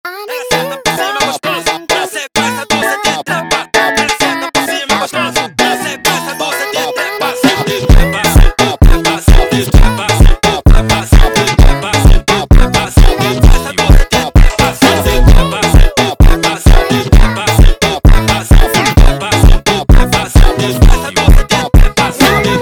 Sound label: New Soul Funk